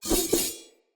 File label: Dagger Spin Whoosh; Weapons; Enemy; Sinecure Dagger